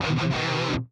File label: Guitar Shot; Metal Tales Fury of the Guitar Gods